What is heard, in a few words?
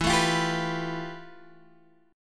F Major Sharp 11th, E